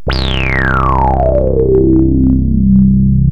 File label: big rez, Kawai SX synths